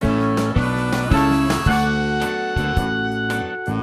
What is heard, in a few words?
ball game intro